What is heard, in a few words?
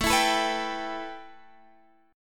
A 7th Suspended 2nd; A7sus2; strummed